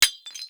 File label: Ferro Pillar Impact Hit; Gameplay; Warframe; Tau; Destructible Dynamic